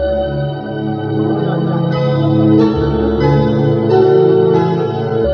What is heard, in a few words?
keys, jazz loops